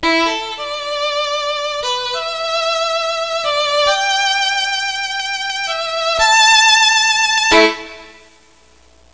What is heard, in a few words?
violin